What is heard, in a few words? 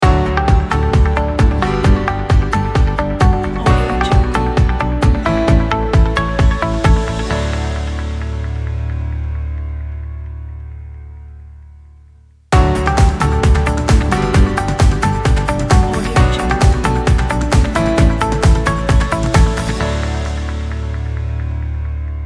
logo opener; red-ninja